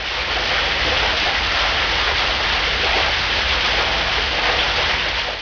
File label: rushing water